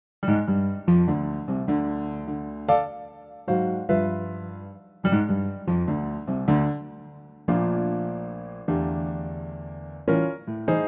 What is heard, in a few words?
Dm piano; key - Dm - vocal range - D to F; A cool and intimate Pianotrak arrangement